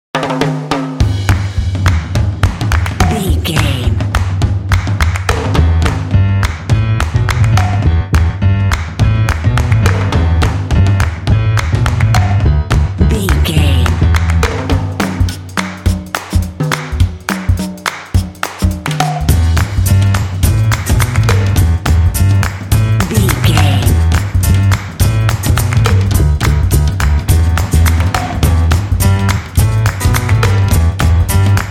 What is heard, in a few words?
drum fun jazz fun